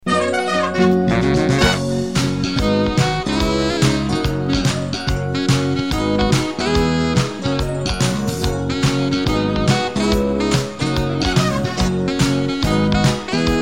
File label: Instrumental; WCCO AM; WTTRW